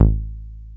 303 A1, TB 303; Hollywood Edge; Giorgio Moroder Rare Synthesizer Collection

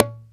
bata

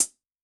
HiHat, Yamaha DTXpress-II

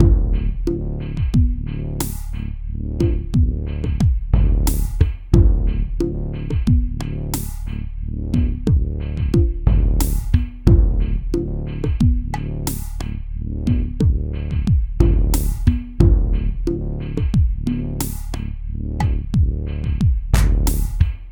Beat, Hip-Hop

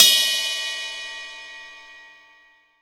O Z RIDE

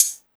HH, 160 BPM, Drum & Bass; Ueberschall; Drum & Bass Flash Trackz

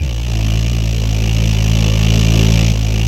LO VOCAL; AMG - Vince Clarke Lucky Bastard

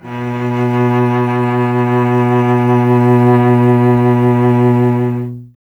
STR cello B, Cello; Miroslav Vitous; Solo Instruments VOL-1

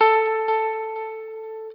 NOODLE LONG, Guitar & Bass

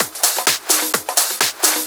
Discohouse Loop 128 BPM

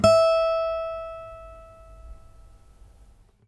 med pick, Guitar; 90 s Sample CDs; Quantum Leap - Guitar & Bass

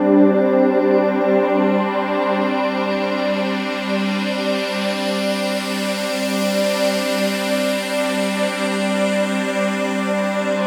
pad; Sonic Foundry (Sony Creative Software); Ugly Remnants VOL-2

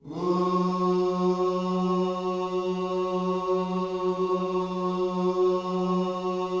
WHOO F#3, Vocal Planet, Gospel; ILIO

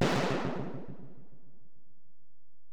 EPerc, Alesis DM-PRO; 300 Drum Machines

